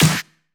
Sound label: Pre-Shifted Claps; Vengeance Samples